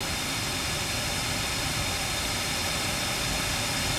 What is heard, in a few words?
Fake Air, Noises; Synthetic's Serum Collection; ESW Ear Candy Noises